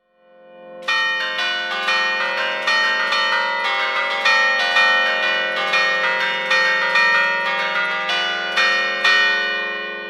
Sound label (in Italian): campane; Giampietro Migliorini, di Bergamo, musica in 6/8 per cinque campane; appresa da Agostino Casari (1901 - 1978) campanaro di Martinengo; Miragolo San Salvatore, 6 - 8 - 1993. Registrazione di Valter Biella